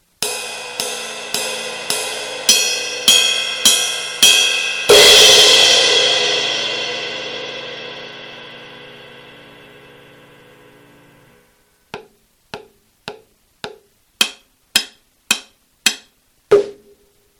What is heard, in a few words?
ride